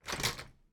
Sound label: pick Item; Barotrauma; Subsurface